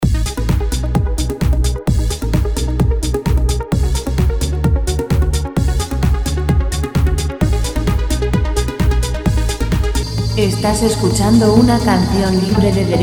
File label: 130 dance; background